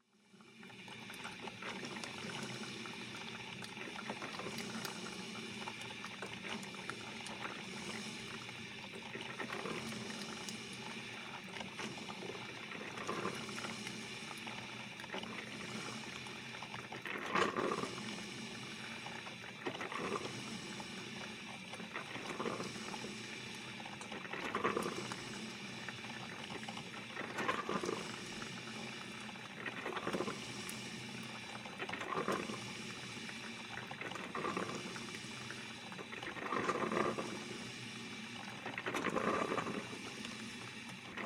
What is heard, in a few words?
Household Coffee Brew; Appliance Sound Effects; Appliances (Sound Effects:Household); SFX Bible 6